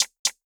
perc pullup, Hip-Hop Drum One Shots; SD CAICOS Hip-Hop Sample Pack; Slate

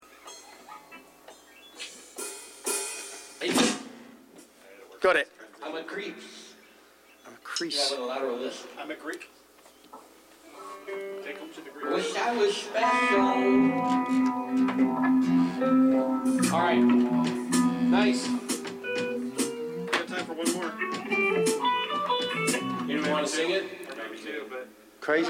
Background Chatter Mix

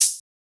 hat insta, Open Hat; Hip-Hop; Drum One Shots; SD CAICOS Hip-Hop Sample Pack; Slate

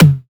tom, Drum One Shots; Slate; SD MIDNIGHT Sample Pack; Toms

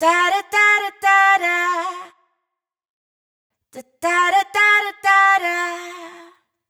riff Dada Da 120 Fm wet, Vocal; Slate Digital Omnivox Vocal Sample Pack

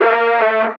vocal shaped Gm; SD AURORA Sample Pack; Slate

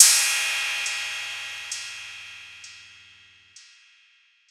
cymbal splash trap; Drum Sample Pack; One Shots; Slate; SEISMIC

